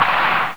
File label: CRACK